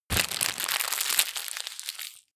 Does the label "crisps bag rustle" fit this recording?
Yes